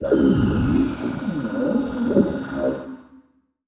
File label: alien signal; Stellaris